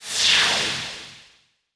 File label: symbol In; netent